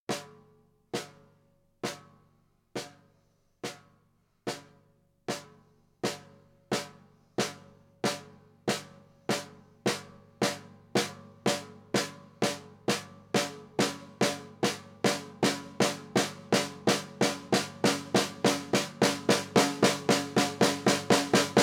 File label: snare liftoff